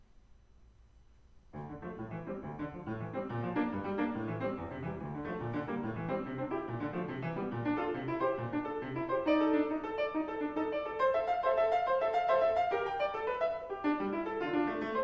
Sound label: trio